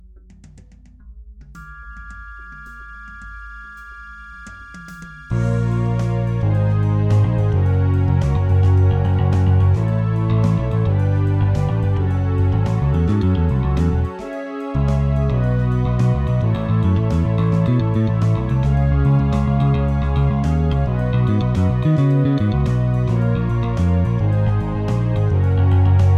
j bass finger; MODO BASS; IK Multimedia

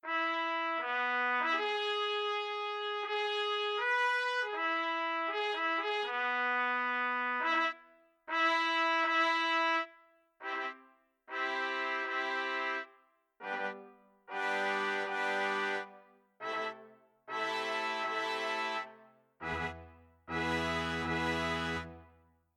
Brass Ensemble; Sonivox; Film Score Companion; Orchestral Companion Brass

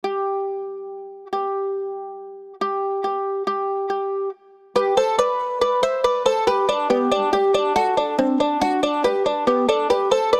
Mandoline; Evolution Mellow Mando von Orange Tree Samples

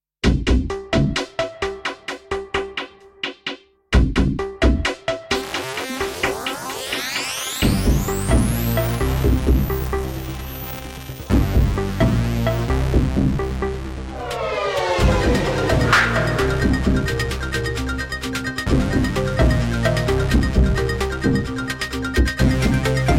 system overload

